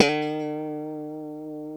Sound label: E 3 FUNK SLD, GUITARS, FUNK STRAT; Spectrasonics; Hans Zimmer Guitars VOL-1